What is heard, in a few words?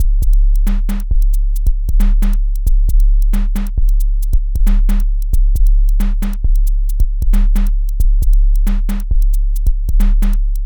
beat triplet